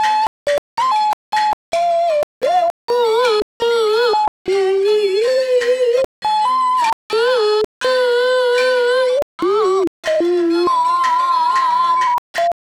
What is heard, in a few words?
synth combined